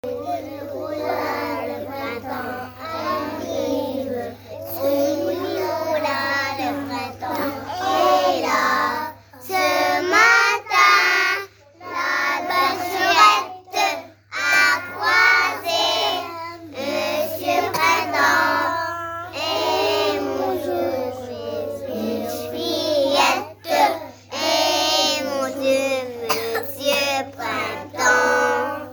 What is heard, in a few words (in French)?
chanson printemps